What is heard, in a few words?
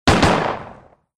gun